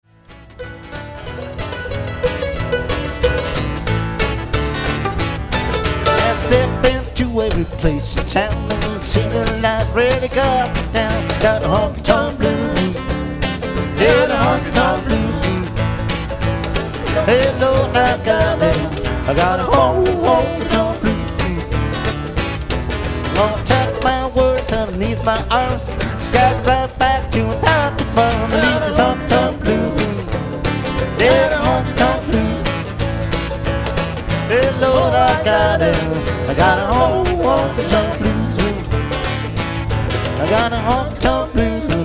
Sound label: Honkytonk Blues